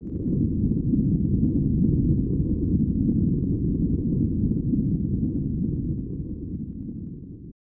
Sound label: Rumbling Waves; snes